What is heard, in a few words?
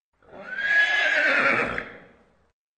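neighing in a stall close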